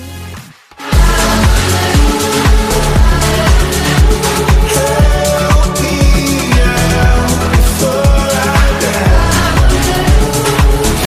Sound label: electro ringtone